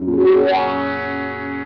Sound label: WAH UP, Funk Gtr & Wah; PlatinumPhattCD2; E-MU Producer Series Vol. 8 – Platinum Phatt